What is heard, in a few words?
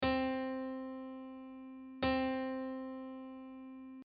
Middle C